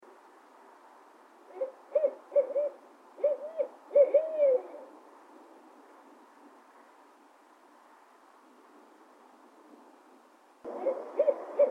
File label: Owl noisy